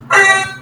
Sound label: beep